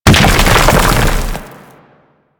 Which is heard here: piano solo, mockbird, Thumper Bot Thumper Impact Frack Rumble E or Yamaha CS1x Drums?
Thumper Bot Thumper Impact Frack Rumble E